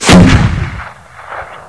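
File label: m41a grenade launcher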